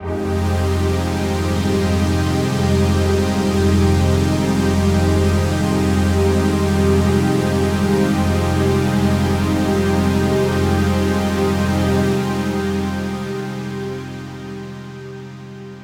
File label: pad fizza F